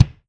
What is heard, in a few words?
fireworks